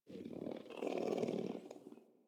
Puppy Idle Purr; Enemies; Vocals; Kubrow; Warframe

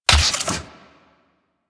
Fire Tail, Weapons; Lato Prime

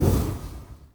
Invis Rune Smoke Melee Sweep; Powers

